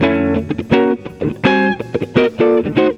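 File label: JAZZ GUIT GROOVE; Best Service; World Colours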